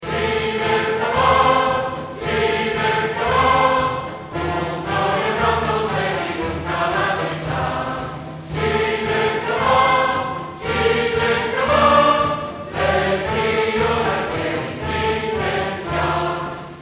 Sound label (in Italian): SUON CIVETTA